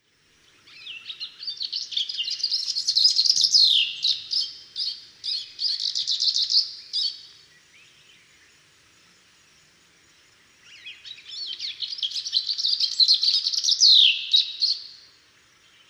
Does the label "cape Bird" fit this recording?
Yes